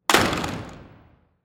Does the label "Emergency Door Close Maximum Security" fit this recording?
Yes